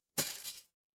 glass shatter

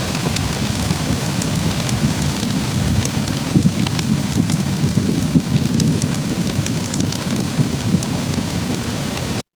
ambience rain

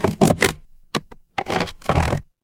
door chest open

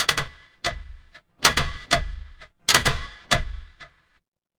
mechanical gear

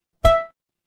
ui alert

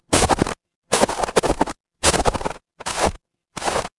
footsteps snow

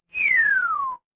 cartoon slide whistle

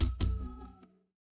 feedback fail

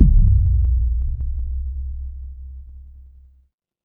transition impact hit

